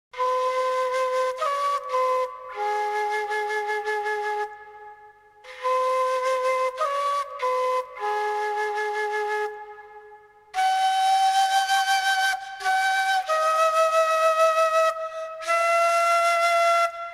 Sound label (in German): Indianische Harfe